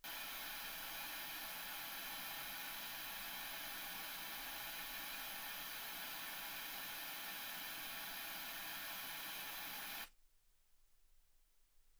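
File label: white gaussian noise 48kHz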